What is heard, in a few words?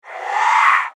Alien Gate; Alien Sound Effects